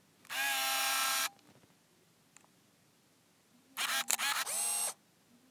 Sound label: Konica Big Mini BM-201